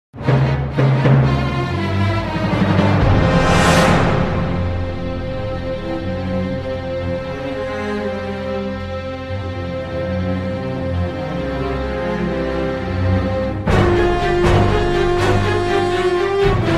instrumental ringtones

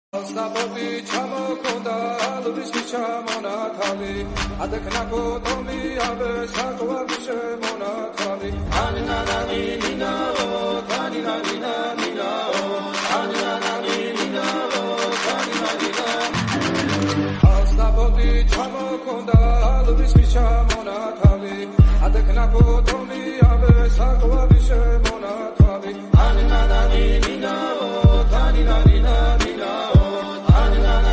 attitude ringtone bgm ringtones english ringtone

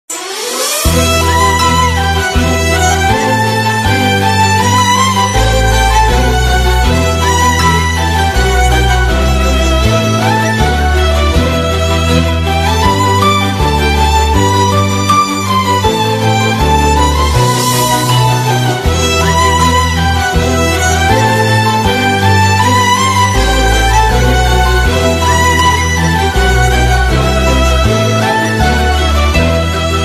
bgm ringtone emotional bgm ringtone; deiva thirumagal

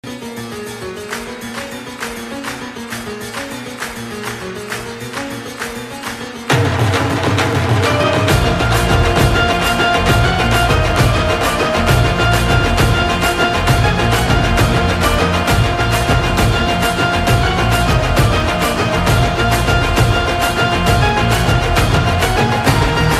instrumental ringtone, ganesh chaturthi

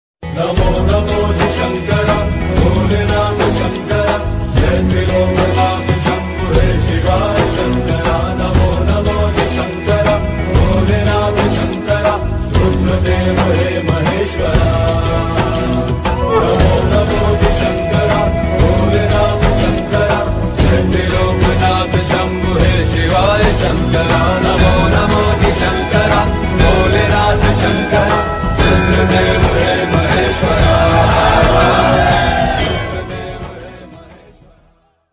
song

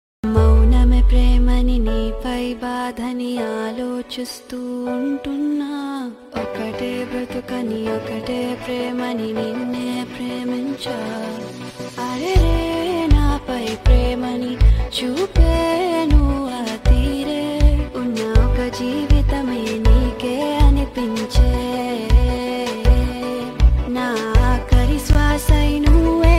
telugu love songs

